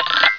Rapidly ascending xylophone (short "on" notification); ToggleButton on